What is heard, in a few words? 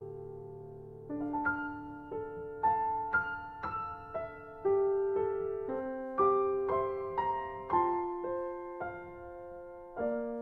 Piano; Roxas